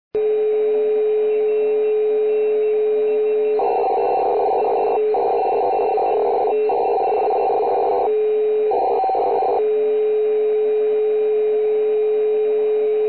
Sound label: CW AGC